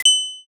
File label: register